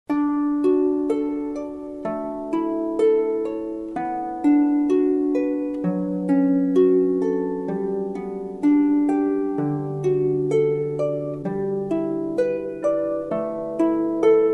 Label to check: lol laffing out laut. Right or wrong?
Wrong